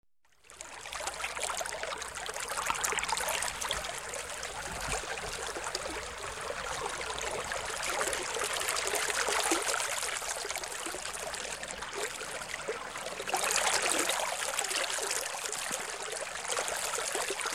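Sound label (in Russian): мелодичное журчание реки; zvuki vesny; zvuki prirody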